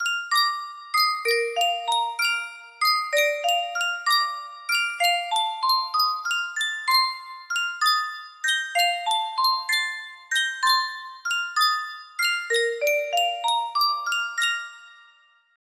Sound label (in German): yunsheng spieluhr